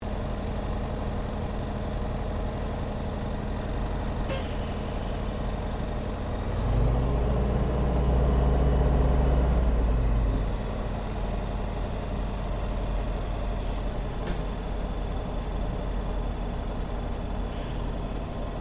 1979 YAKOVLEV YAK-52 IDLE SYSTEM CHECK INTERIOR, Russian Yak 52 Training aircraft